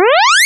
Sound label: logchirp synthesized; dafx18